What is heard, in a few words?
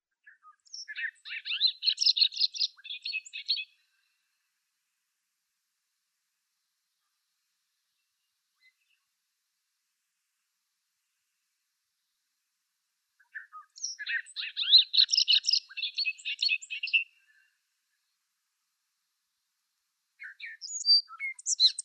Bobolink song